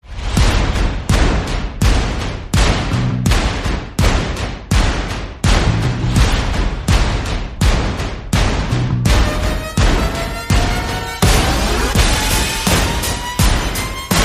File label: Percussion Bass and Effects